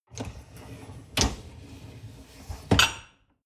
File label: dishwasher upper tray draw in